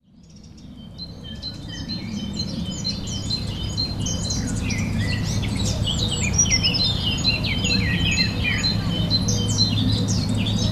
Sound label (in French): Oiseaux les Seilleres